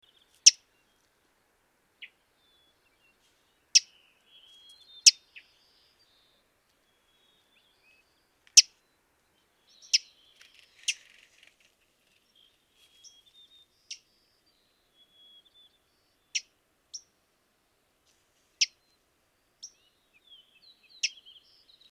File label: Passerella iliaca call; passeriformes; passerellidae